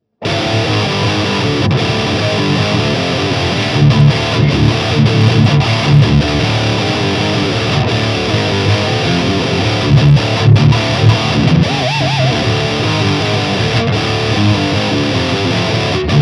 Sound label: High Gain